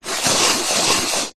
household cup plastic empty slide upside down on table magic trick; zapsplat